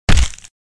Heavy body impact bone cracking

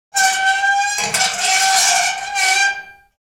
Horror metal gate squeaking

